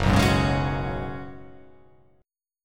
F sharp Suspended 2nd Suspended 4th

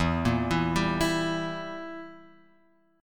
E Diminished E; Edim Chord {0 1 2 0 X 0} arpeggiated

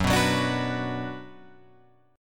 F sharp Suspended 4th Sharp 5th, Guitar, Open F; strummed; F#sus4#5

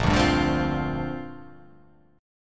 A sharp 6th Add 9th

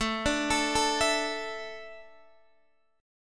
A Suspended 4th, down, Guitar, DADGAD

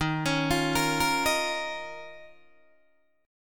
Eb 7th Suspended 2nd, Guitar, Open D

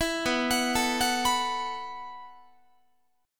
B 7th Suspended 4th B down Guitar

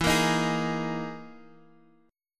E Major 7th Flat 5th Guitar Open F; x,x,4,5,6,3; strummed

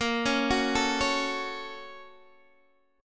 A sharp Minor Major 7th Sharp 5th, Guitar Open D

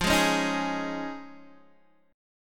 F Minor 7th Sharp 5th Guitar Open D, chord; x,8,6,7,6,6; down; chord shape

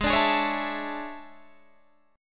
E 13th G sharp

